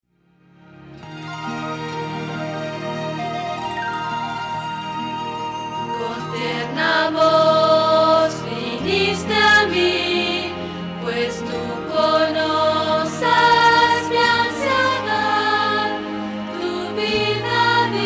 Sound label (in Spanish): Contralto